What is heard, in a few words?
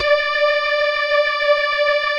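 dance organ D2; Best Service; Advanced Media Trax Modern Composer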